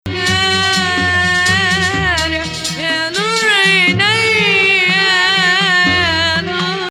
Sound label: Hijaz, maqam; Rast